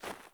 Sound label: Sandals Run Snow, Footsteps; Characters; Player